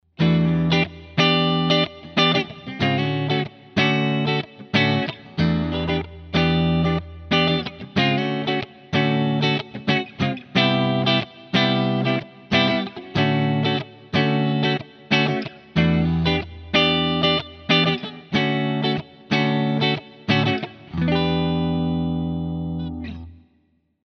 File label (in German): Epiphone Modern Les Paul Beide PUs clean